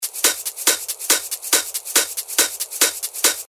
140 BPM, techno loops; Sigma; Revealed Techno Top Loops Vol. 9

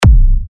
B, techno kicks; Stampede; revealed techno kicks vol 10

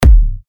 28 C#, techno kicks; Slasher; Revealed Techno Kicks Vol. 10

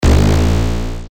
A, bass shots; Overweight; revealed bass shots vol 2

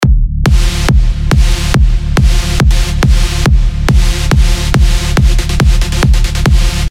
Stab; Stab Pump Up; Revealed Serum 2 Big Room Techno Drops Vol. 1

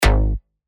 bass shot G#; Voltage; revealed bass shots vol 4

